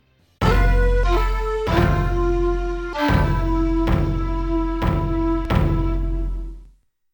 Victorious Battle; Samurai Shodown; Samurai Spirits

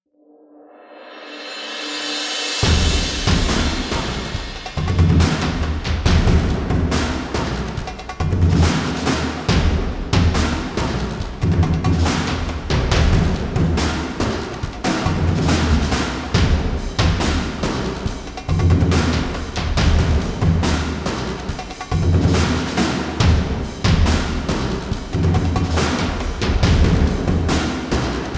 Combat Loop Layer

